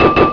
CLANK

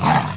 monster uh; Exile III v1.0

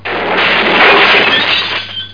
glascon

